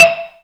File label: ZYLIMBA; Greytsounds VOL-1